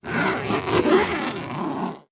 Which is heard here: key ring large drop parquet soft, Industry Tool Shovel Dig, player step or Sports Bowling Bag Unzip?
Sports Bowling Bag Unzip